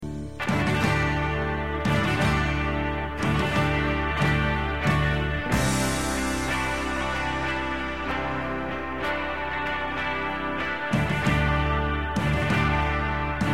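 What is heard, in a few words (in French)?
Solo, guitare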